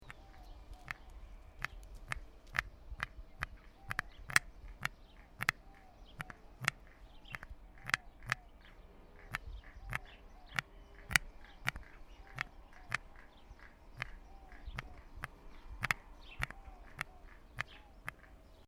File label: horned guan